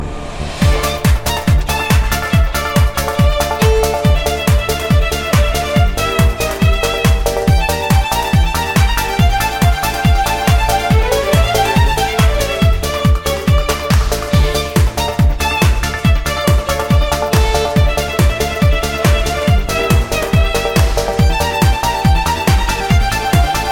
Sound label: czardas